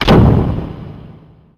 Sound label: door close